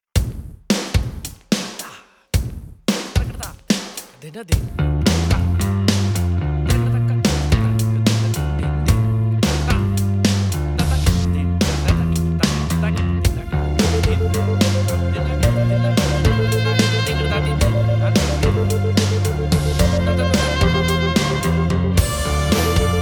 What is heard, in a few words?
Four String Epiphone